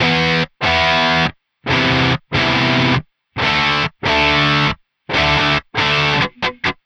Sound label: Distorted Guitar 140BPM Emin; Vengeance - Essential Clubsounds Vol.4